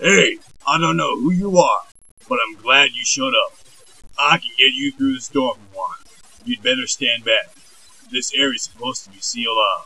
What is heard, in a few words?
grunt; svencoop2